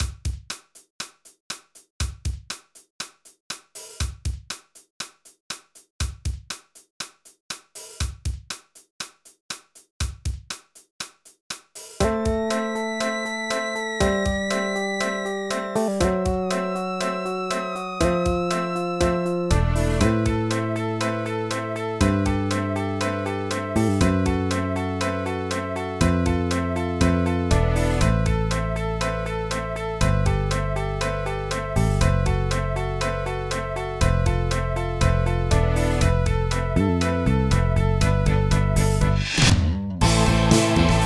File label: Rock Stage Karaoke; Pinky Street Kira Kira Music Hour Pop Princess; Rock Stage 3